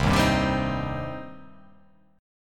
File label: C Minor C down; strummed; JGuitar